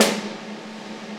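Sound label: Snare Hit Loop; Digital Sound Factory Virtuoso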